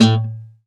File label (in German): KONZERTGIZ, Alpine Volksmusik